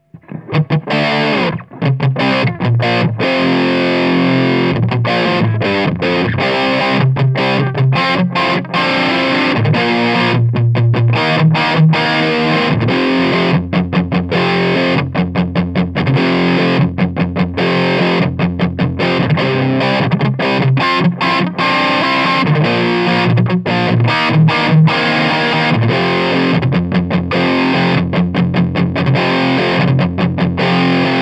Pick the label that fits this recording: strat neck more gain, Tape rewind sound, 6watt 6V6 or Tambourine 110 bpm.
6watt 6V6